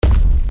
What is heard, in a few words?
curved step